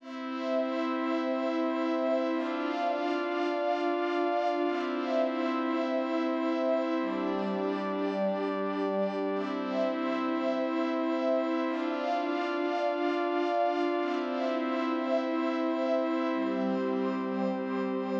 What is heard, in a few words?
Pads; super crush k.o.